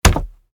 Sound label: weiqi heavy